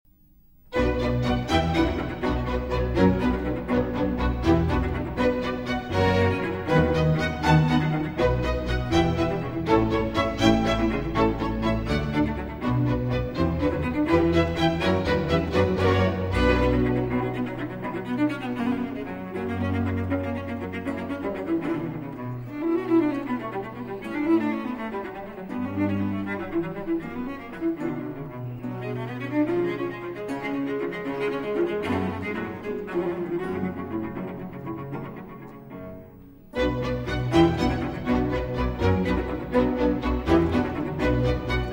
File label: Cello Concerto in C Minor, Alleg; Vivaldi; RV 402; 1. Allegro